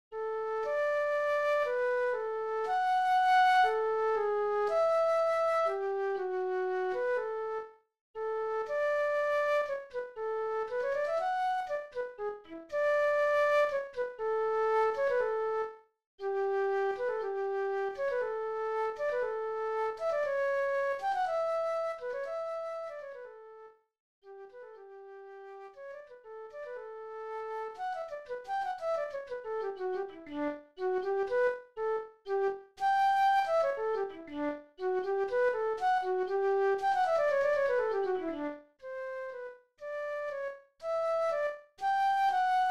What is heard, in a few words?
Trumpet; Band Audition